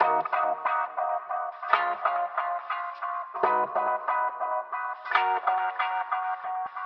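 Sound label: Dubby band pass Filter Delay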